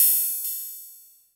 Percs, Elektron MachineDrum; SPS1 MKII

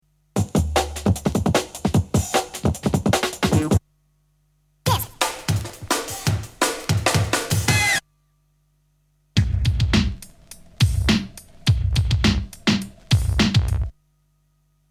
Breakbeats; Kniteforce; 1000 Samples Volume 1

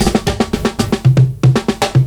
FILLS, 70_s Breakbeats

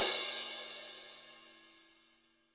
HiHat & Crash, Roland TD-20; HiHats

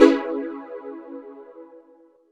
C#m7, Chords; Electronisounds

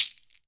SHAKER, Heart of Africa; Spectrasonics

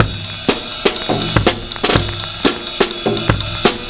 Sizzle 123 bpm, breaks; Zero-G Beneath the Planet of the Breaks

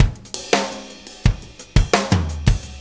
Drum Tools, Loops

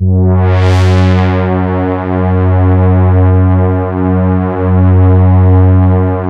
MONO PAD; PADS

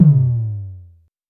vermona drm1 mk3 tom

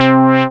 SYN SH, Roland; Sound & Vision - Gigapack I CD 2

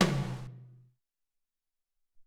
Gate Tom, Alesis SR-18

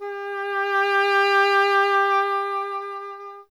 WND, Solo Instruments; E.HRN; Miroslav Vitous - Solo Instruments VOL-2

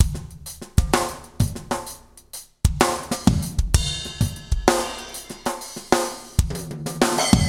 HERRIDRUM; Soundengine - CSR-000 The Art of Transwaves

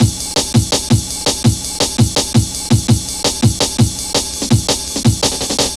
Harsh, Drum Loops 166.5; Zero-G Jungle Warfare 1, 2 & 3

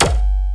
Izzy Kik, Percussion Hits; Zero-G; Soundclash; Acidized Wavs

